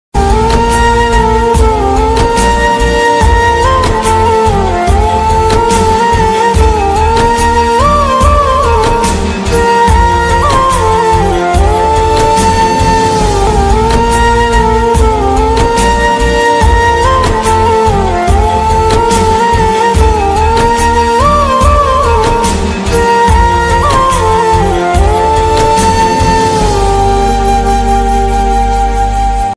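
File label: background music ringtone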